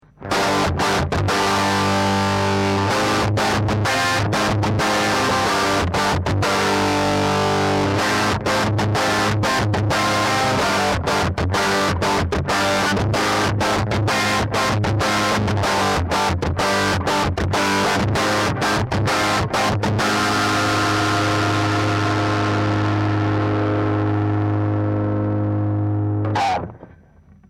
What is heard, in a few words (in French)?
disto rythm